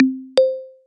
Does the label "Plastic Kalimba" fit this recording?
Yes